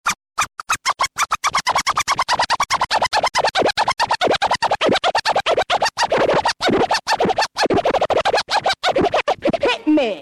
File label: scratch